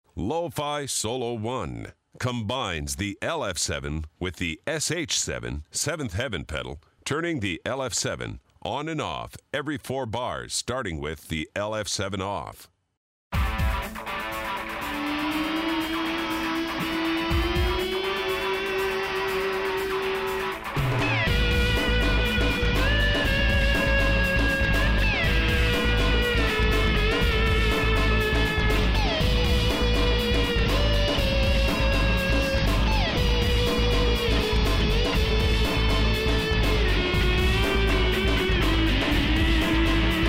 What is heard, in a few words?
Lo-Fi Solo